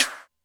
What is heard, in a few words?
Face Slap, Alesis D4 drum machine